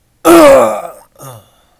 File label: death